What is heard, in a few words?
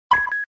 Shutter Sound, Camera; LG GM600